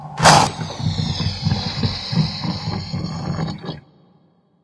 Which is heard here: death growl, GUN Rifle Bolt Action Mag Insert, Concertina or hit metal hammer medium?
death growl